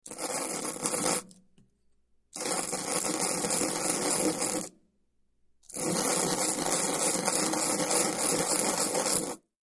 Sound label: Powder Grind Mix, Powder; Stir / Grind 1; A Granular Powd; A Granular Powder Being Forcefully Ground Upon A Piece Of Paper